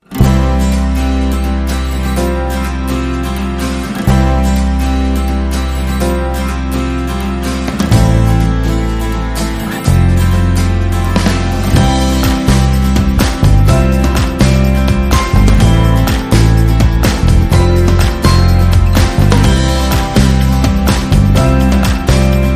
Warmful Indie Anthem

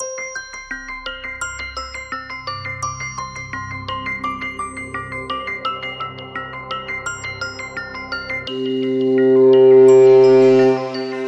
Ambient

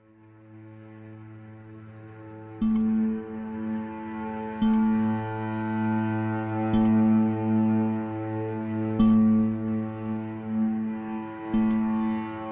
Underwater Devastation, Reduced

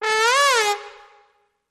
Trombone High Gliss Up and Down

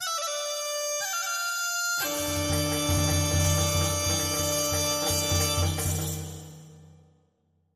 Ancient Greek Dance

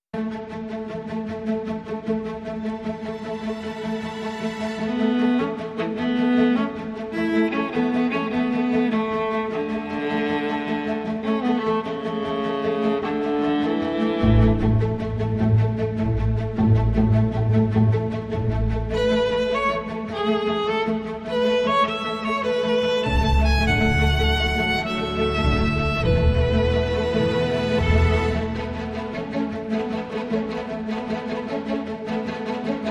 Strings

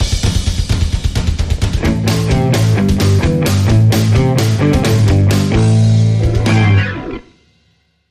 Speed Rock

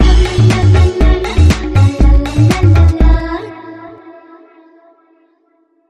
Hit

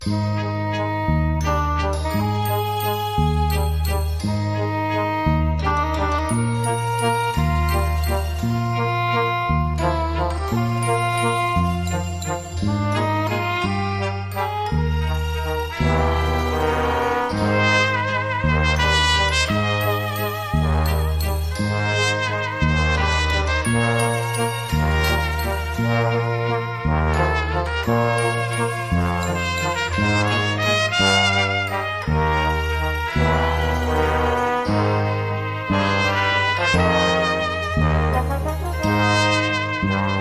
Waltz, Lighter Quirky Trumpet

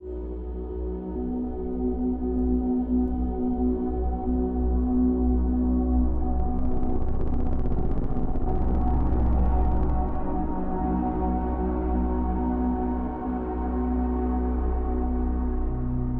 Drone, Light

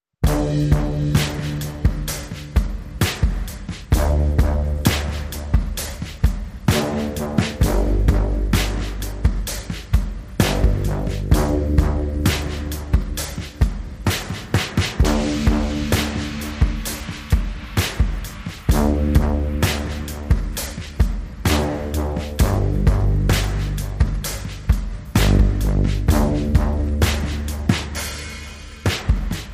Drums and Bass Mix

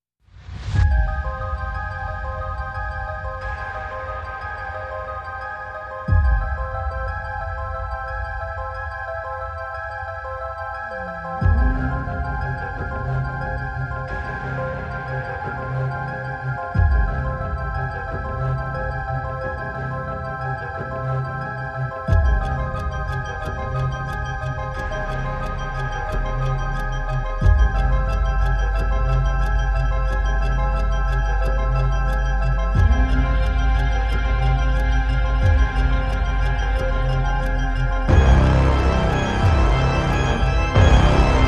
No Orch No Choir

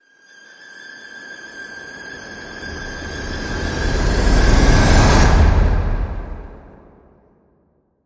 Excitement Rise

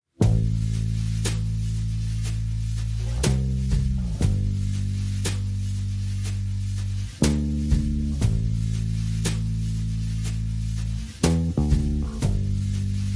Drums and Bass Mix

